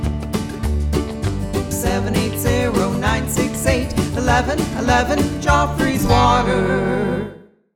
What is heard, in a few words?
Phone Jingle